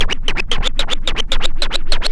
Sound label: SCRATCH, Vinyl Scratches